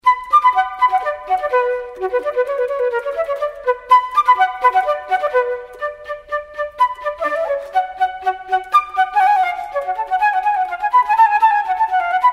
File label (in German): querfloete